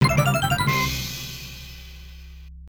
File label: Coin; don doko don; taito f2 system; arcade